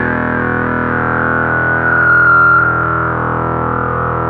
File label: ARP 2600 E2, synthesizer; Hollywood Edge - Giorgio Moroder Rare Synthesizer Collection